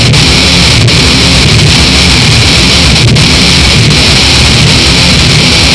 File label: ultrahardcore, loops hardcore guitar